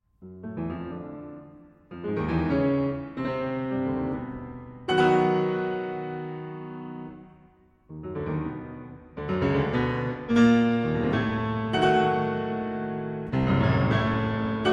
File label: scherzo no3 c sharp minor